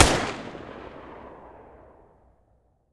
muzzle protecta mono distant, weapons

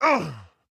pain; STALKER; IWP

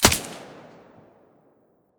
aug silencer mono, weapons; STALKER; IWP

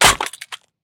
riffle drop gravel; STALKER; IWP

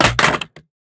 riffle drop wood; STALKER; IWP

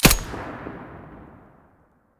muzzle stereo, weapons; fort

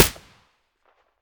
aps silencer mono; STALKER; IWP

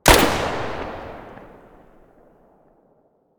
muzzle mono distant, weapons

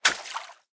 bolt water, material; STALKER; IWP

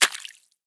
grenade water; STALKER; IWP

